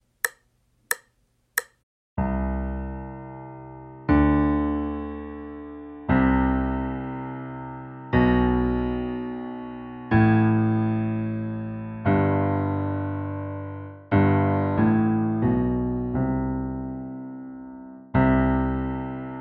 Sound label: Waltz